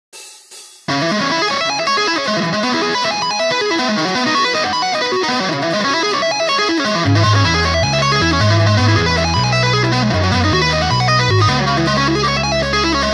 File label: Metal Cover; TOP GEAR Las Vegas